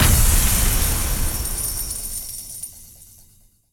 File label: Big Win Text Change; aloha